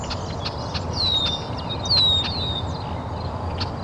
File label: Red-winged Blackbird; ibm glen